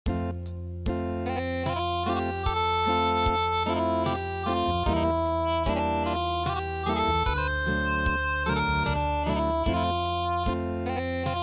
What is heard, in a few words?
Unplugged, midi